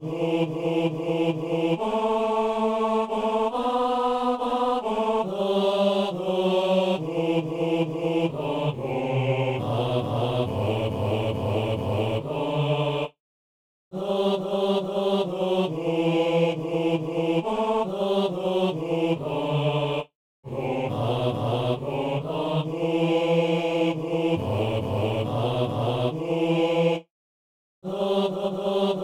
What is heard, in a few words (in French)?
basse